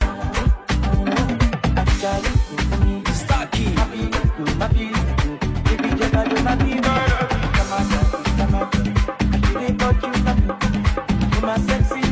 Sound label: Dance Drum